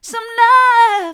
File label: some love soft, vocal